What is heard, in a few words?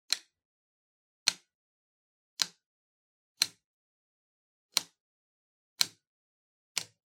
Soroban Upper Plus